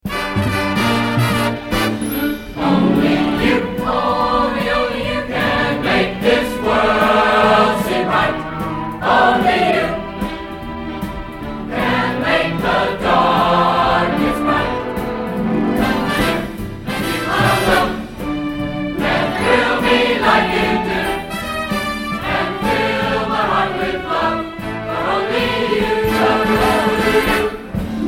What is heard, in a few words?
(with vocals); Miss Teenage America Pagent 1975